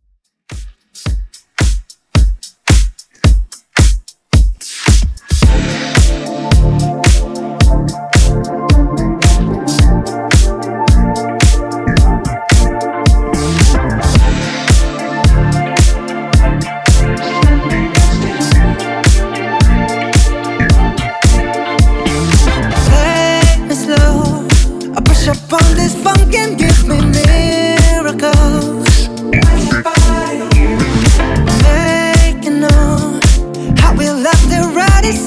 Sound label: Redrum 110Bpm